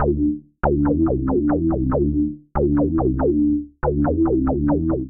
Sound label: Hang Bass D Dorian 94 bpm; Bassline; Tonal; MIDI Clips; Drive and Glow; Ableton; Factory Packs